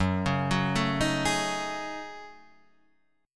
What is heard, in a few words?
F sharp Minor 6th Add 9th, Guitar, Standard; arpeggiated; JGuitar